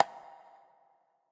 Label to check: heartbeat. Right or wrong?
Right